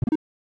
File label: collect, 8 bit sound effects